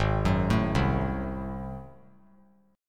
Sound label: G sharp 7th Flat 9th G sharp down, chord; G#7b9; arpeggiated; JGuitar